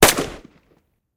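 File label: single confident shot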